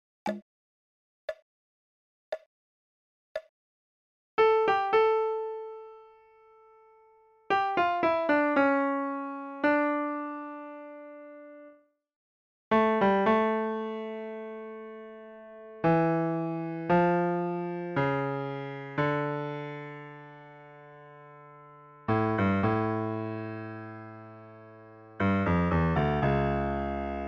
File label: Toccata Fugue Dm Slo